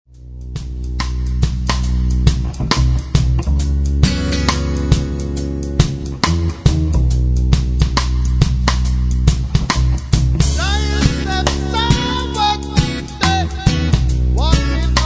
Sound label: (acoustic version)